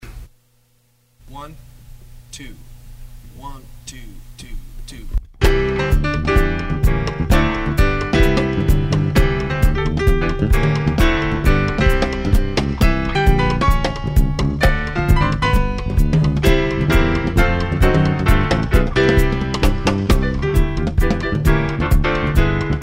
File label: with drums